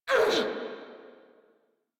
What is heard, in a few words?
Pain Grineer Female, Barks, Battle; Warframe